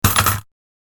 clink small rubber ball dropped in plastic tub of coins real